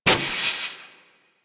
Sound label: Heat Gun Mech, Weapons; Grineer